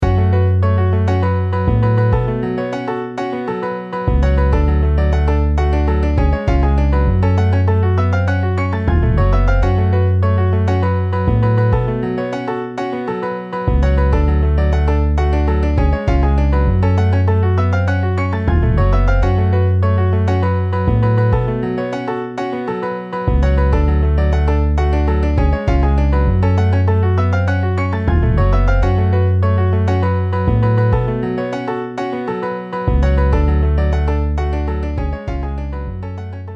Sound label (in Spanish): salsa y bamboleo